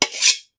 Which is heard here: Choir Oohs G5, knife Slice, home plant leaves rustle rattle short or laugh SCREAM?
knife Slice